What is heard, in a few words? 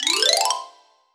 Xylophone; musicradar; cartoon caper